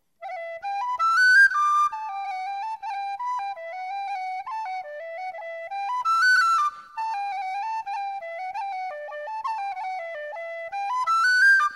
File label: Freeman D Bluebird, whistles; Wrong Foot Forward; Road to Errogie